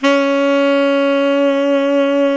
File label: Tenor Soft C#4, tenor sax